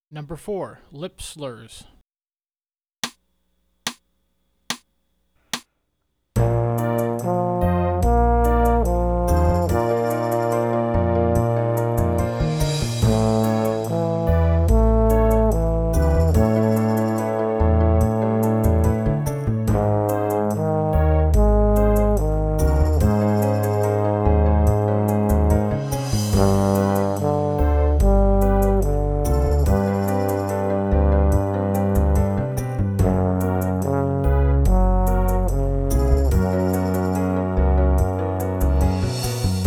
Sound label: Lip Slurs, trombone; 15 Minute Warmup